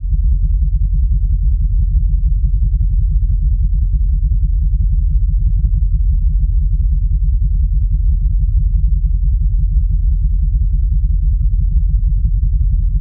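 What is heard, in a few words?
spaceship rumble; spacecraft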